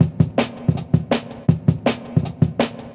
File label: 162 Jungle, Jungle Breaks; Original Jungle Breaks